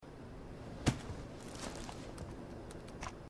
take bread sound; Life is Strange 2; gamesoundopedia

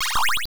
pickup herz item; castlevania

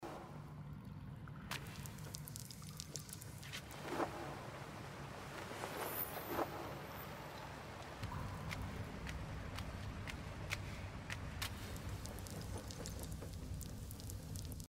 soundscape water; gris

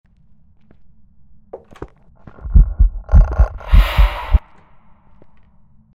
ghost event; Phasmophobia; gamesoundopedia

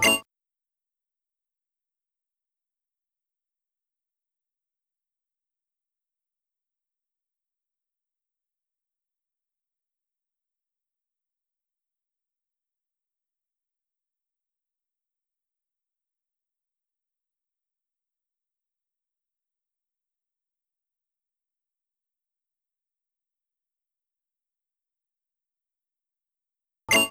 click new gen; pokemon x y

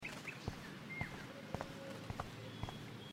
walking stone; life is strange

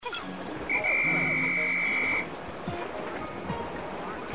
whistle; pikmin 3

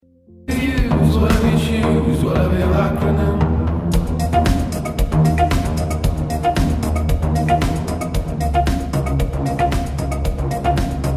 club inside musik; life is strange

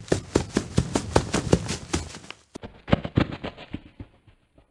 foxy running chasing after you; five nights at freddy s

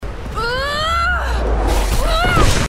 big jump; rise of the tomb raider; lara

